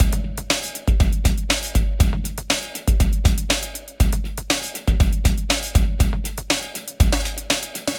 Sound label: Ambienza Beat loop 120